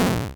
Hurt

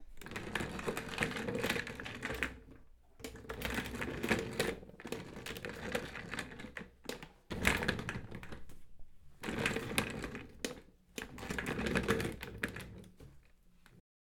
Chair

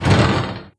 Hatch Door Open

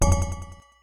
Ball hit

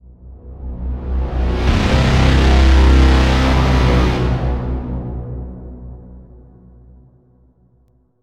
Cinematic Braams Horn

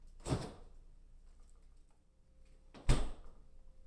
Freezer Open Close

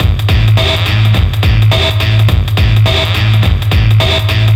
Trashy Breakbeat 105bpm

